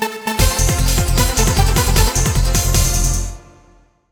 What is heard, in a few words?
Game Start; Arkanoid Live